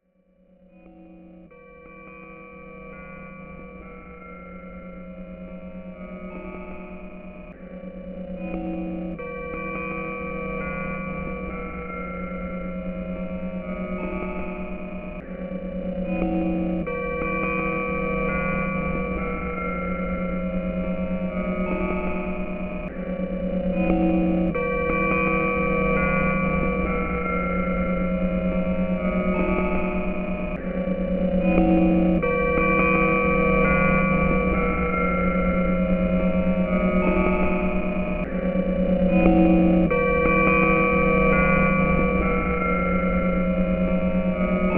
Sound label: Key Chimes Full Song